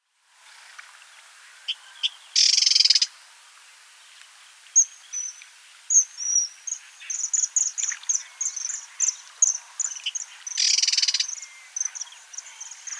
Menetrie Warbler

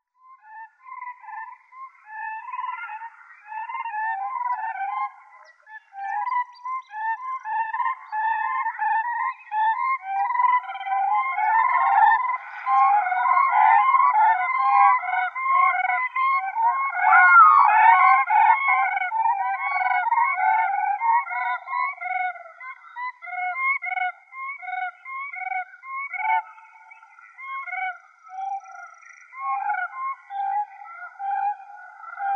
Siberian Crane

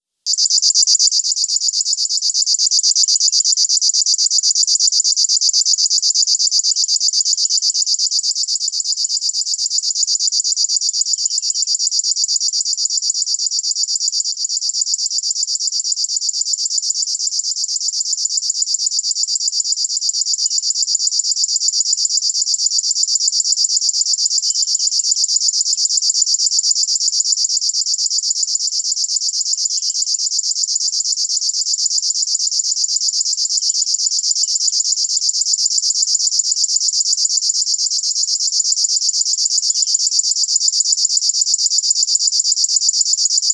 Eurasian River Warbler

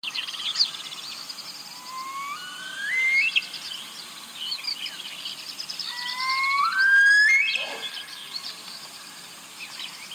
Caspian Snowcock Song

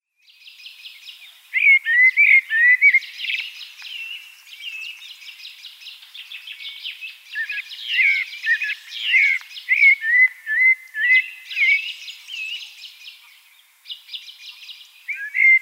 Eurasian Blackbird

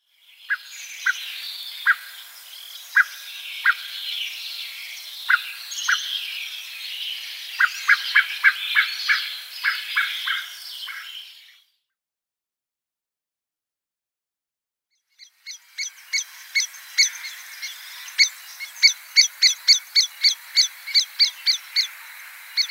Eurasian Blackbird

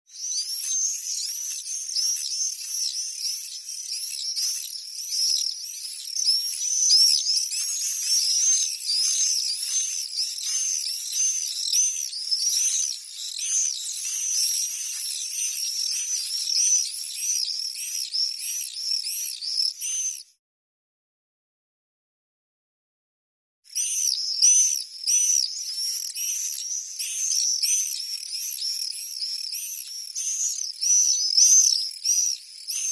Common Swift

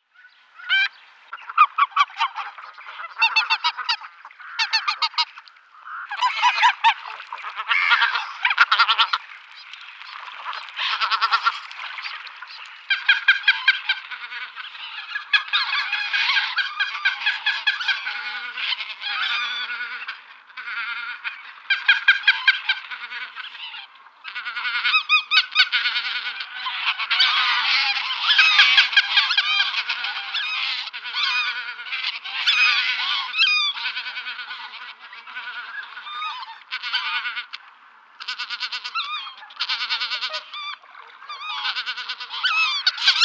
Greater White-fronted Goose

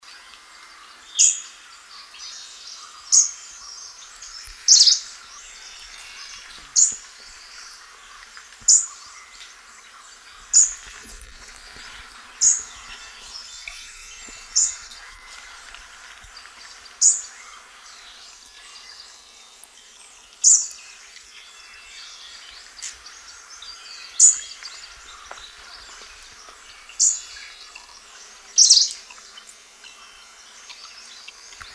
Green Warbler

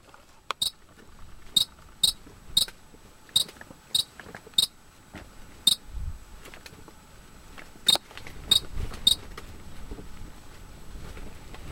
Pleskes (Iranian) Ground Jay

